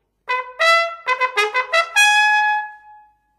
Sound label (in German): Eskadronsteten Ruf